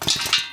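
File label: knight smash; Politburo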